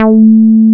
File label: BASS A4 P; MR.J BASS